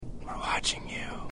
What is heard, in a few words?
Laughing Man